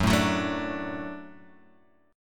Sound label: F sharp Minor Sharp 5th, Guitar Open G; chordshape; down